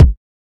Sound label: reverb kick drum sample c key